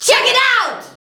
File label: VOX, Singin; Sound & Vision - Gigapack II CD 2